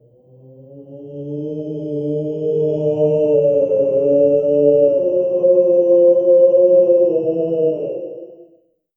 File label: howling winds that never, gale